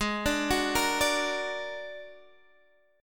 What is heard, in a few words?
Bb Augmented 7th Ab, chord